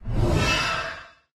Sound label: woosh; server-nalc; warps